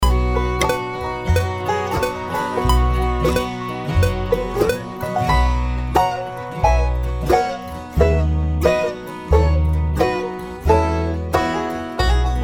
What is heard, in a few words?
banjo solo, half